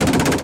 turbo backfire; rigs of rods

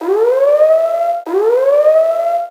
alarm; endless sky

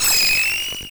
spider growl; project hawk

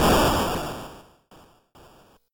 explosion quiet; project hawk

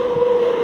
screetch; rigs of rods

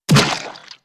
splat; edgar

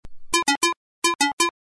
GOT PART SND; commander genius

